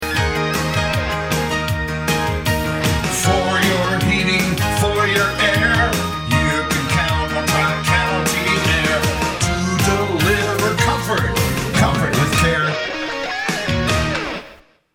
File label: jingle